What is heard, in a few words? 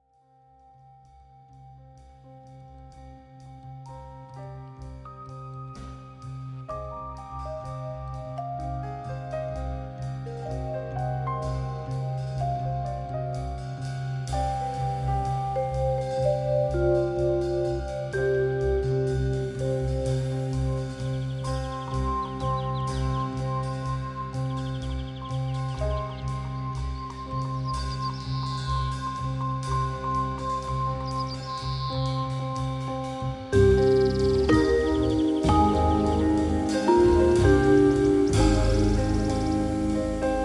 forest